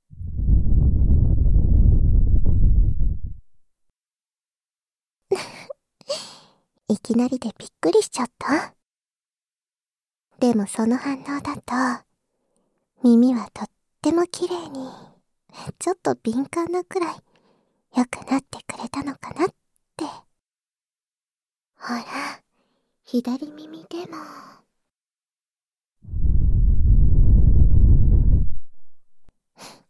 耳舐め手コキで焦らして限界勃起

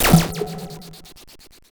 痺れ効果音

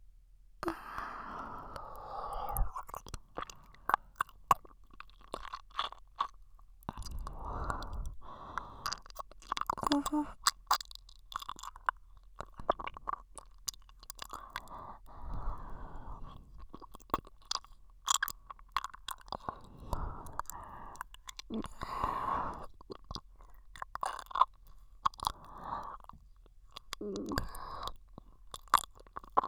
舌を突っ込む耳舐め

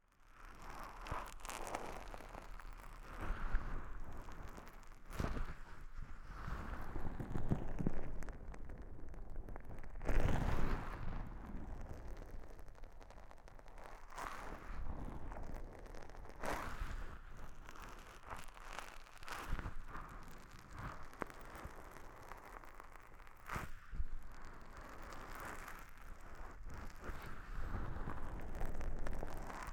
温かいタオルでお耳をマッサージ(両耳同時), ASMR

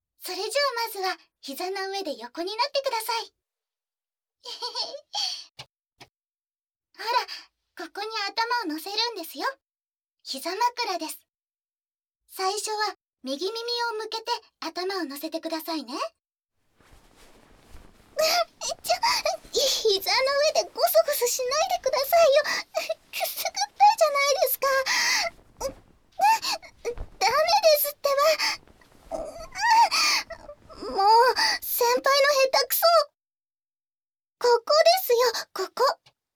右耳のマッサージ&耳かき, ASMR

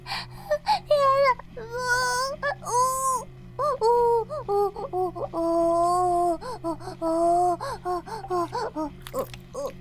バイブ放置; イクたびにわけて

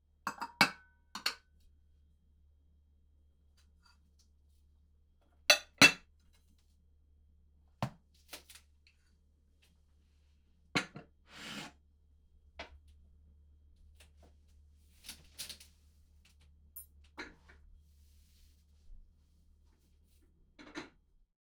食器を重ねる; 3DIO; 食事に関する音

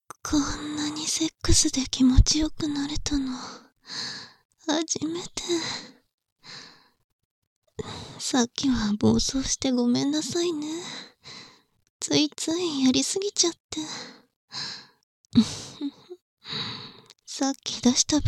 お掃除フェラ（バイノーラルマイク収録 効果音あり大きめ）, ASMR; 効果音大差分

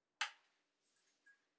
スイッチを入れる

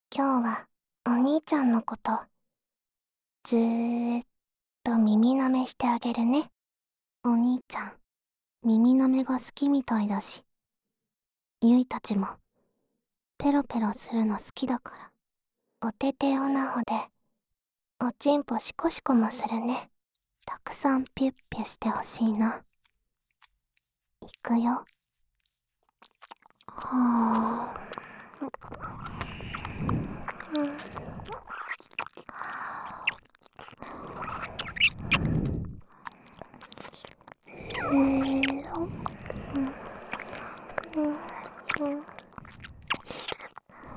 二人で耳舐め; 『二人で耳舐めするね』

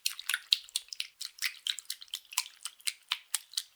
ストローク中早, 水音効果音

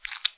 くちゅ音単発

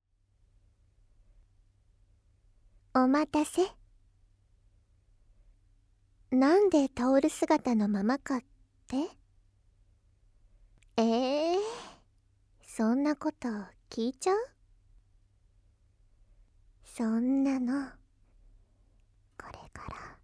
とろとろ濃密な耳舐め・手で気持ちよくなってほしいの(効果音なし), ASMR

